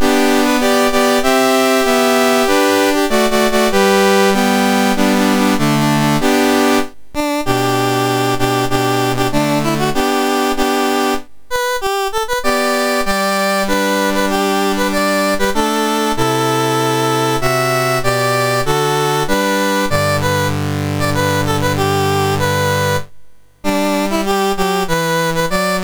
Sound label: MOS6581R3, National Anthems; Peoples Republic of China